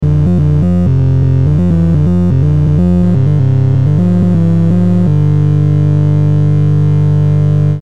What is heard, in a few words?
ringtone